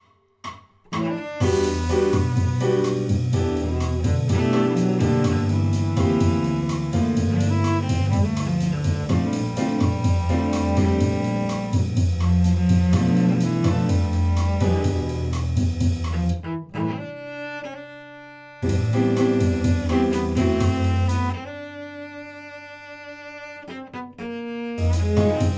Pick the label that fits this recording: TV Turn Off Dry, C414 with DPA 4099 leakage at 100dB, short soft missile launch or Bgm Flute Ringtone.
C414 with DPA 4099 leakage at 100dB